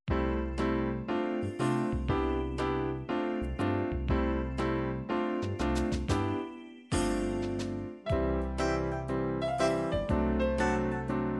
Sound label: melody cruising, midi